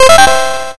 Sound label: win